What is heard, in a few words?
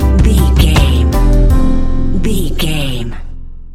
chillwave vibes stinger, A relaxed and chilling piece of music